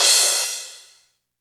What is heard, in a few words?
Cymbals, Elektron MachineDrum; SPS1 MKII